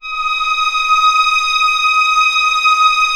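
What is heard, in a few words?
strings D#4 PP L; Sound On Sound - Peter Siedleczek's Orchestra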